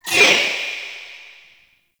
Choir Erupt Proj By

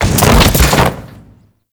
Fall; Grineer; Body Falls

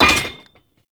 Debris Metal Stone; Ambience; Gameplay; Warframe; Entrati

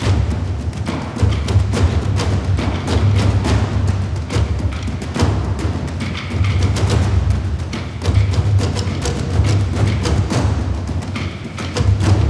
Projections Music Loop

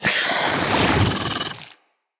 Spider Elite Vocal Attack; Root Spider Elite

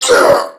Pain Crewmen; Warframe; CorpusCrewmen; CrewmanB

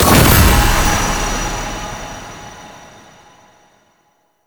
Hazard Hero Target Break; Warframe; Duviri; Undercroft Hazards

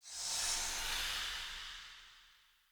Short Sword Throw Wind Up; Weapons; Player

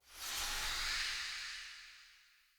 Short Sword Melee Wind Up; Weapons; Player

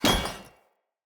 Two Handed Mace Drop D; Weapons; Enemy; Blunt; Sinecure Two Handed Mace

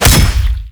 Ghoul Devourer Body Impact

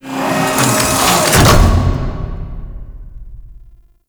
Mine Mach Raise; Warframe; Grineer Galleon; Counter Intel

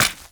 Step Default, Footsteps; Warframe; Grineer; Royal Jester; Foley

